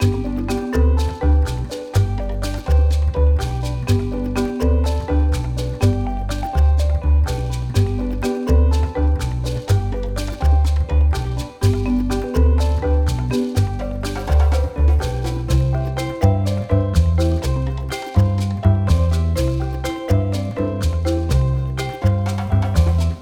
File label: Happy Village Life, Traditional Africa; Narrative Mind